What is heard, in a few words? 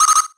shoot